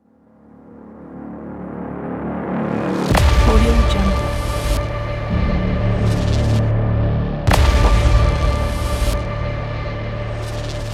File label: trailer intro; edrecords